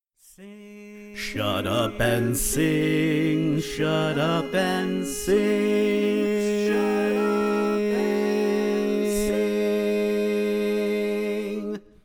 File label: Bass, barbershop tags